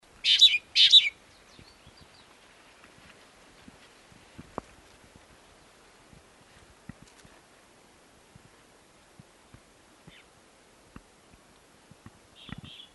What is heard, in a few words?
small ground finch